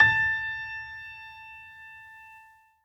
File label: piano echo